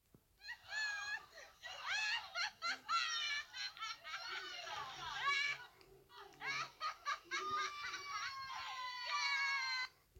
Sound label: Cackle